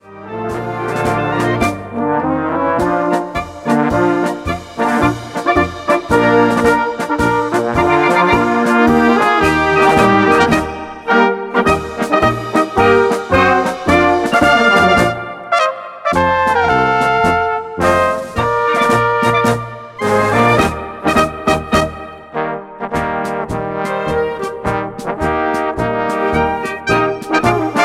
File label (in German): Blaskapelle